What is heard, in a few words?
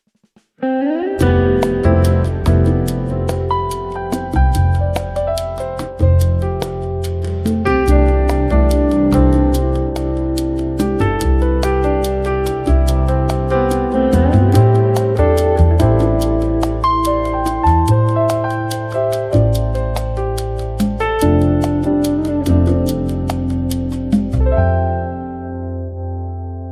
Jazzy Mellow; ninomushi sound library